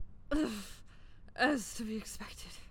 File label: low hp